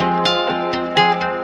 guitar

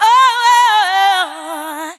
"oh", sung

